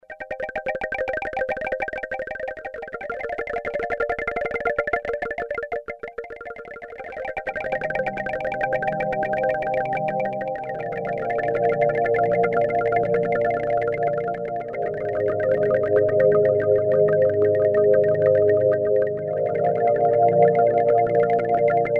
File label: Bamboo Pad